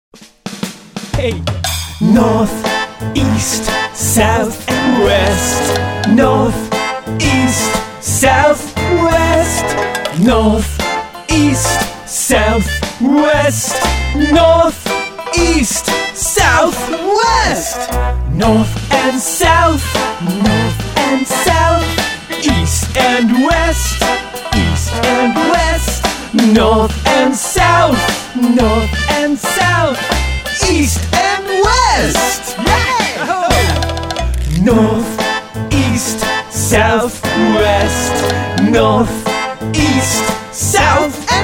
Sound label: north south song